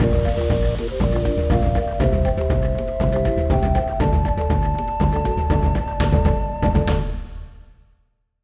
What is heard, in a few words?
Win Level; Zuma's Revenge